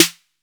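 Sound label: snare, EMU Orbit-3 drum machine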